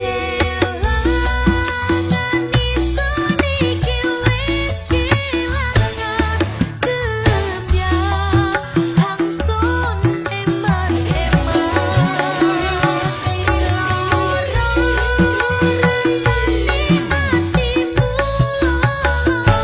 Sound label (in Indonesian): Koplo